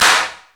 AMB CLAP, HAND CLAP; AKAI S6000 CD-ROM - Volume 5

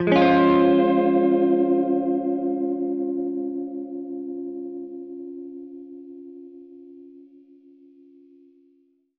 Gretsch Chord Fminb6, Guitar Chords; undercover samples

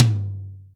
DRY, Acoustic Tom

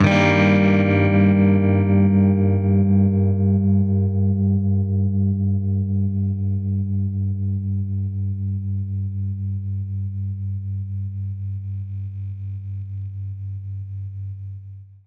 Baritone Chord Gminb6, Guitar Chords; undercover samples

